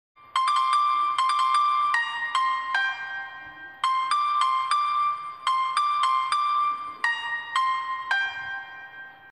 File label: Whistle Tune in Piano; Jawan; Srk